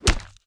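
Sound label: crowbar hit body, weapons; valve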